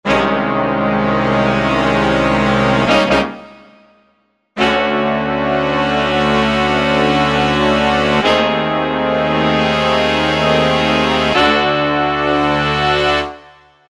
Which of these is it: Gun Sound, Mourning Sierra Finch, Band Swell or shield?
Band Swell